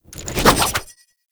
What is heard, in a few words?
Sword Spin; Warframe; Duviri; Dax; Weapons